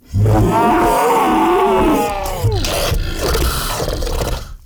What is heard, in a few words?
Ancient Tank Pain, Enemies, Infested, Barks; Warframe